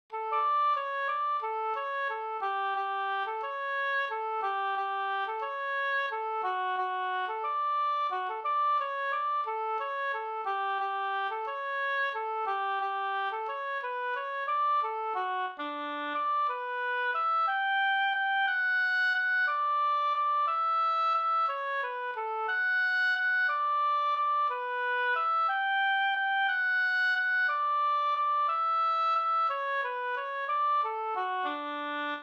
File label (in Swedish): folkdans, Ddur; Hammerschmiedgesellen